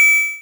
Computer Code Correct; Backrooms 2D; Android iOS online Windows

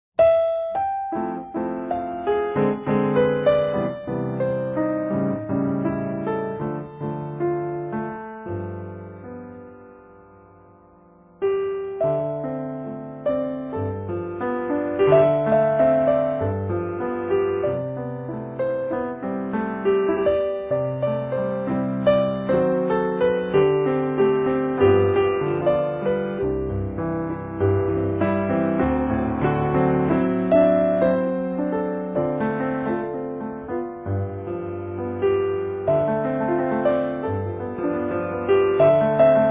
Ambient; Saints Row 2; PS3 Windows Xbox 360 gamerip 2008

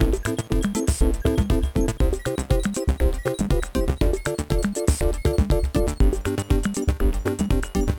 Level Clear; Donny Donut 3 Original Soundtrack

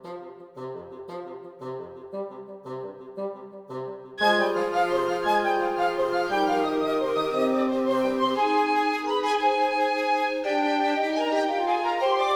Music Genius Woodwind; 7 Mages

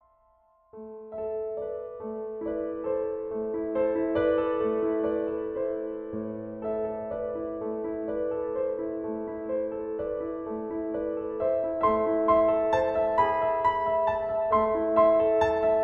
Impromptu in Blue

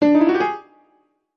Walk; Black Knight Sword; Walk Ochiru 03

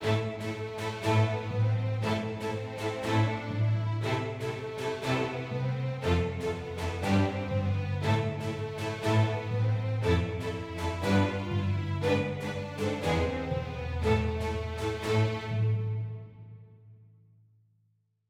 heroic med; Quivr; PS4 Windows gamerip 2016

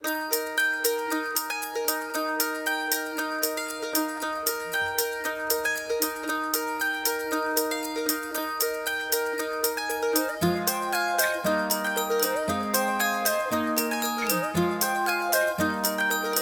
music party preps; My Little Pony

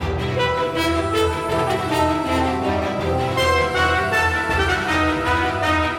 Battle Loop; Infinity Blade 3

